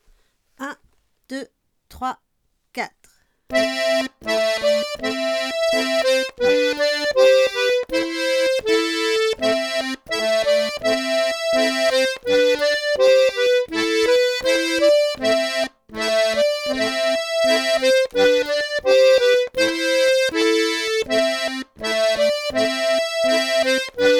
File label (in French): rigodon 2 voix lent